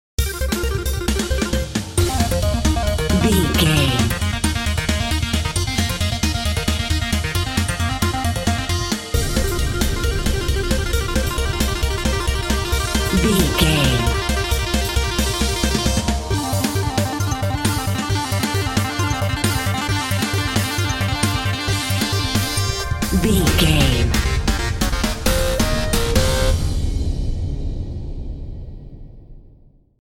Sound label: epic video game mission